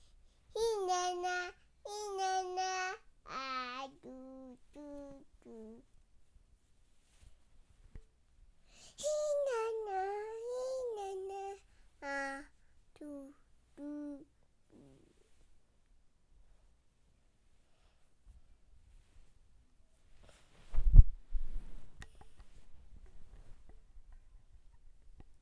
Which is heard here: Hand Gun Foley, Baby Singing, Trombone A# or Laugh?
Baby Singing